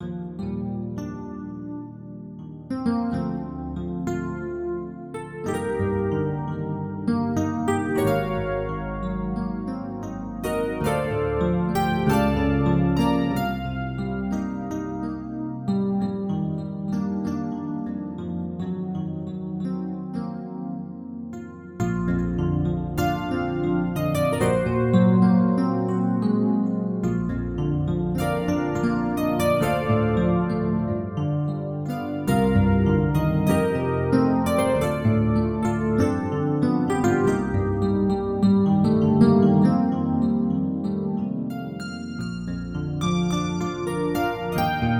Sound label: piano